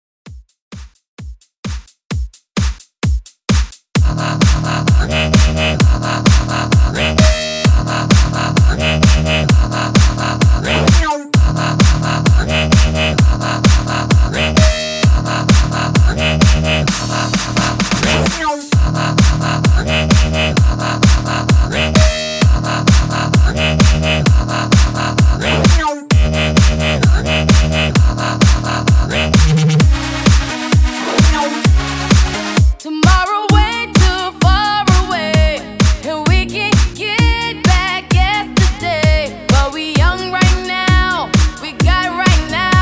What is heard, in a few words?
130 BPM